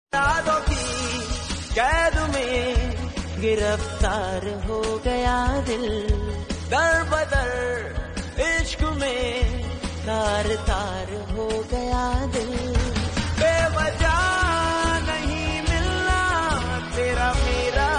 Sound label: bollywood hindi songs; ringtone